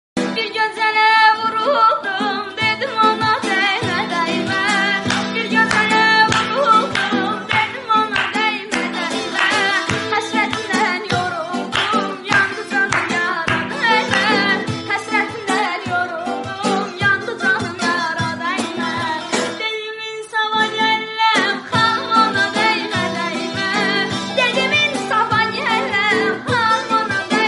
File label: Sedaye Zan With Guitar